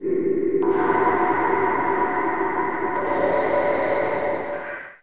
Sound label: stinger; skinwalker goatman; slender skinwalker